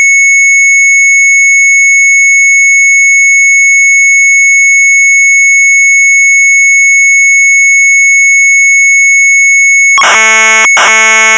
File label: MZ800 Tape; STAATSLOTERIJ; retromelsarchive; sharp mz800